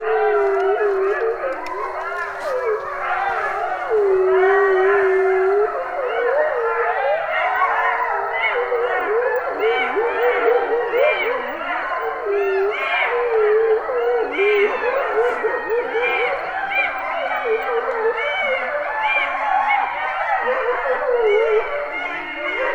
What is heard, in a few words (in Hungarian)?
farkas és aranysakál koncert nem teljes; Hortobágyi Nemzeti Park 2013